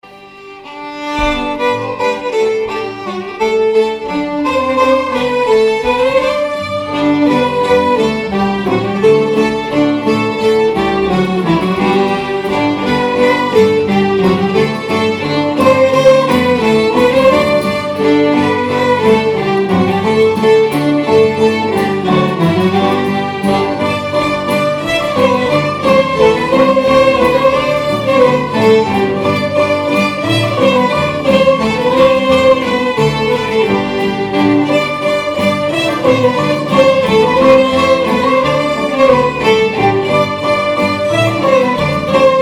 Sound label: Basque March